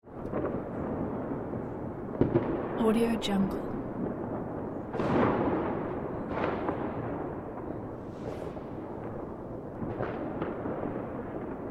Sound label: happy new year fireworks